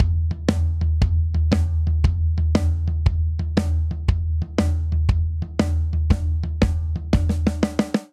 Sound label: Blues Shuffle Buildup 118 bpm, Drum; 4 4; MIDI Clips; Ableton; Factory Packs; Drum Booth